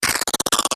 Short Crunchy Stutter

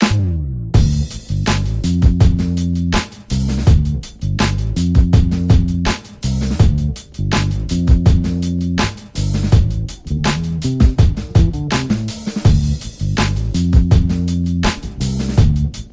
Drum and Bass

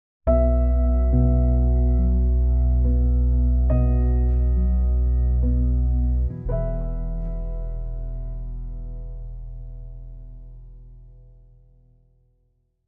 Sting

